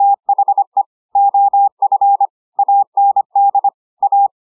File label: frequency